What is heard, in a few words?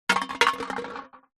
impacts metal water bottle flask crushed drop ground